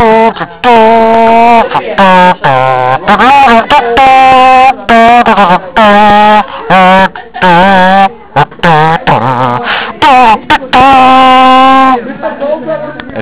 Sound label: blowing his own trumpet